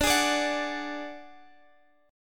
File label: D Major Flat 5th; strummed; x,x,12,13,15,14; chord